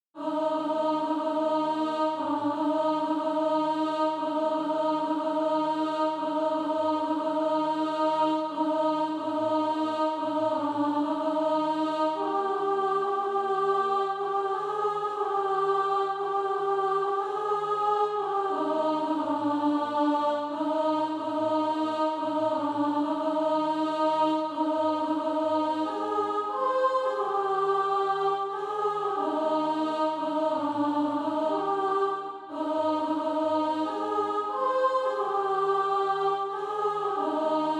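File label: alt, Practise Music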